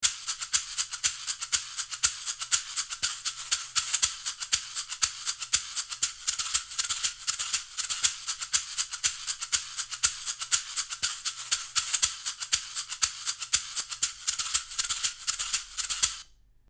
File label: Calima Shaker